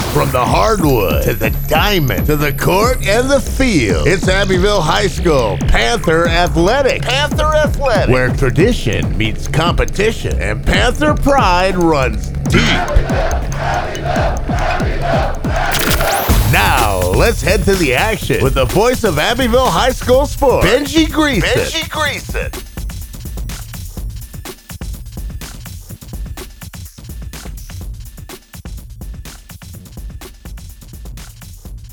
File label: SPORTS GENERIC OPENER; GMG Sports